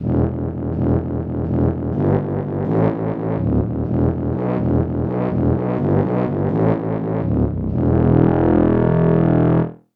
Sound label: brass section bass